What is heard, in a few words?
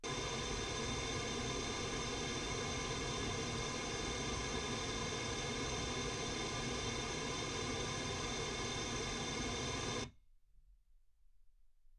pink noise 48kHz